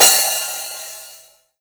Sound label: HiHat Open